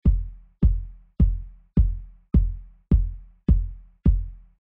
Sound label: Kick R; Remix Sample Pack